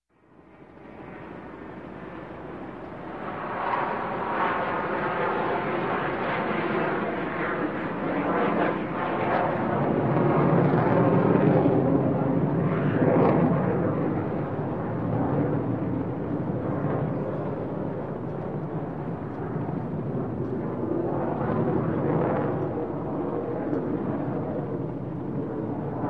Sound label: F-16 Pass By; Sound Ideas; Series 2000